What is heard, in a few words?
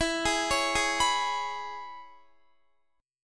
E 6th, down, Guitar; arpeggiated